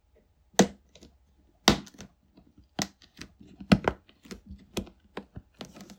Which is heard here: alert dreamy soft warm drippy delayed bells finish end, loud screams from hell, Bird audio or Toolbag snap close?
Toolbag snap close